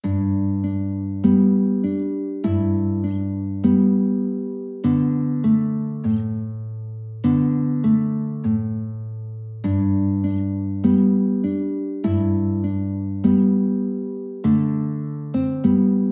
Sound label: camptown races, guitar